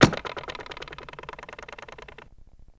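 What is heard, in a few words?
Knife Impact Wood Metal; knives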